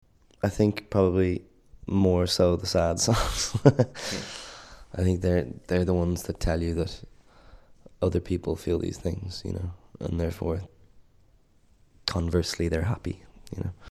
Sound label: happy; villagers